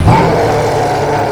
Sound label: nemesis pain, zombie; cstrike; zombie plague